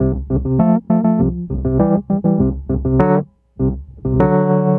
Wurlitzer; Trip-Hop; Loops; Sonic Foundry (Sony Creative Software); Rondo Brothers Trip-Hop Loops & Samples; El Corazon

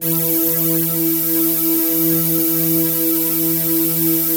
WIRE BRUSH; Greytsounds - Scott Peers Sound Engineering

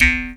E JAW, Primitive Instruments; Big Fish Audio - Didgeridoo and Other Primitive Instruments; JAW SIMPLE

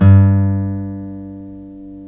STL NV G 2C, FOLK STEEL Guitars; Spectrasonics; Hans Zimmer Guitars VOL-1

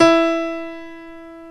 GRAND PIANO; OMI - Universe of Sounds

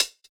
Hhat, Hihat; Equipped Music; Loungin House

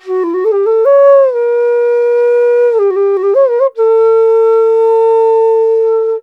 INDIA ALTO

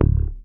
HOFNER MUTE, Bass

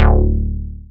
bass, synths

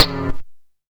DRM HAT Ringer Fat; Polyend; Plughugger

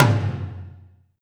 tom lo, Alesis DM5

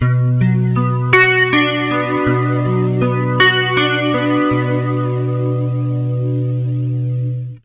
80 MIN ARP B, Acoustic Guitar Loops; USB Soundscan vol.04 - Electric & Acoustic Guitar Loops; AKAI

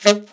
ALTSAX D3; Best Service; Alpine Volksmusik

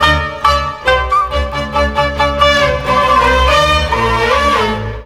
Oiental; Best Service; Ethnic Journey Sound Cube

